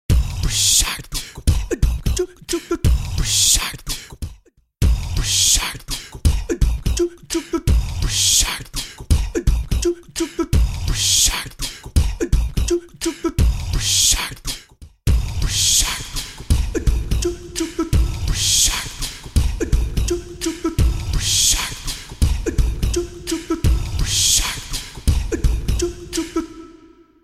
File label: Vocal perc loop